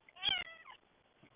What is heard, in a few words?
cat meow